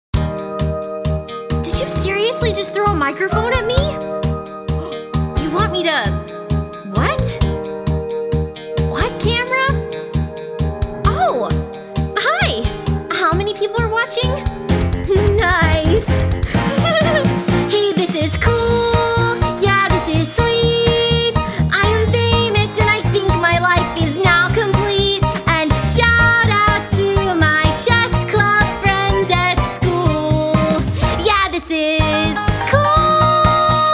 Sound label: VOCAL